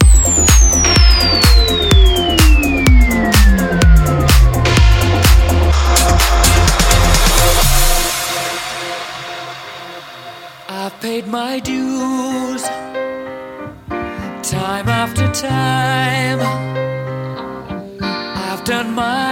126bpm F

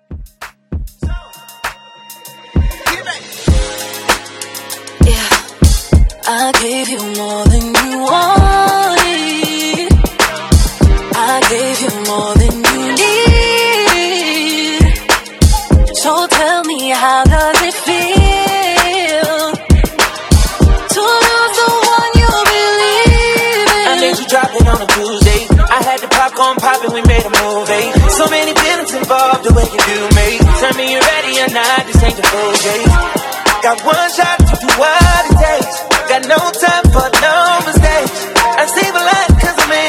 Pop RB ReDrum 98 BPM

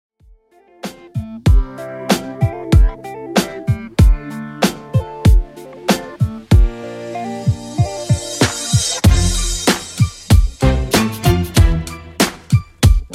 2ks Rnb DJ Friendly Redrum 95bpm CLEAN

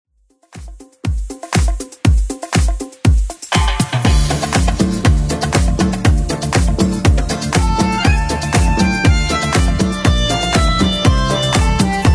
80s Club Edit 120bpm

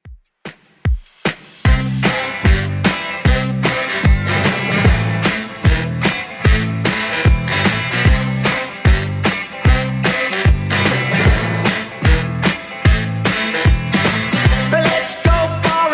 70s Dance Redrum 150bpm

